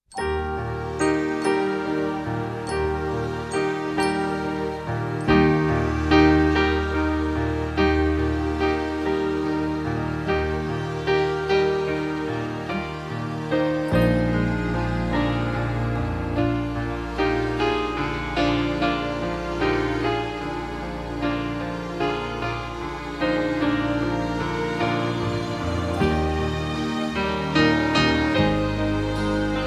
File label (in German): Wiegenlied, Le Piano Et Les Classiques